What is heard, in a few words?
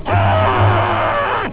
scream; igorsfrightshack